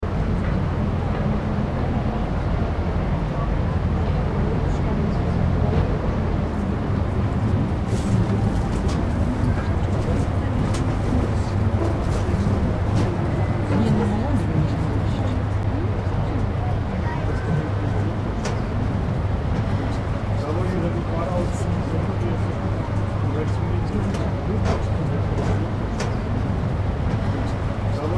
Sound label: observation deck; germany berlin berliner dom